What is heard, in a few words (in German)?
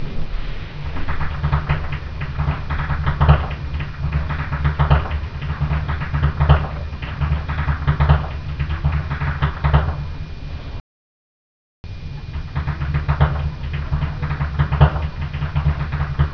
schnalzen, jugend; Siezenheim